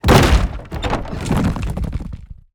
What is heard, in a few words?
wooden box apple overturn